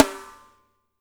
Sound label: PIC SN, DRY KIT; Spectrasonics - Burning Grooves CD2